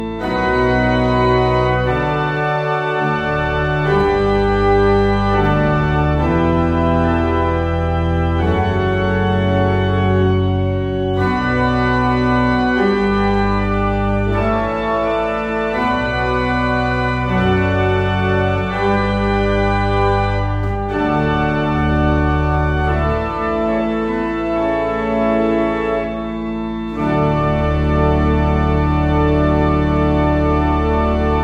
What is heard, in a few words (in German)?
Tutti, Orgel; Dettenschwang; Renovierung